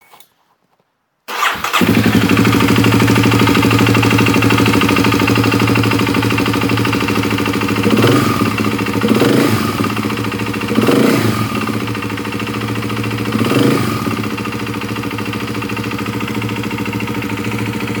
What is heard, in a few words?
bad engine sound; klx 250s; i think my engine dying